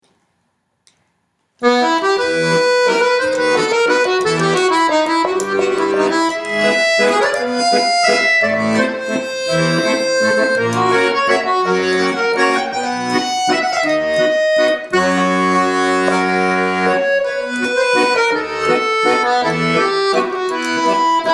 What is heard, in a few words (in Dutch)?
accordeon